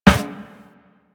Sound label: Run Metal Perforated, Footsteps